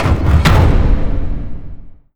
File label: tournament open, ui menu